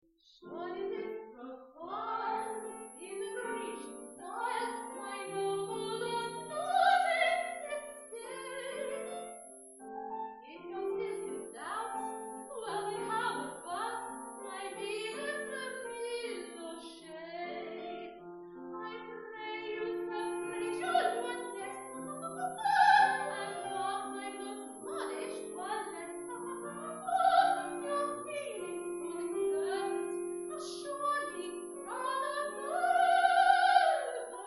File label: laughing song